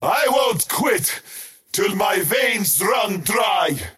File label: skywrath crown attack pain; skywrath mage